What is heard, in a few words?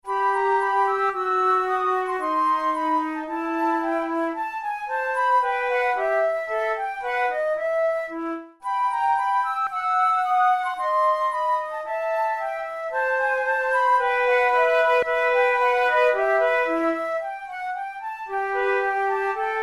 methode de flute; tulou